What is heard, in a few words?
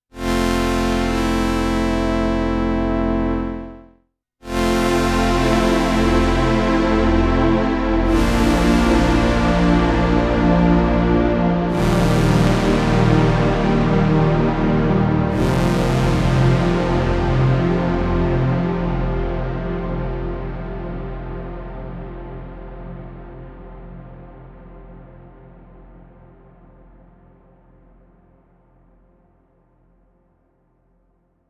KORG Pure Saw Pad